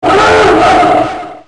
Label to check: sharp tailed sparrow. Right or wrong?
Wrong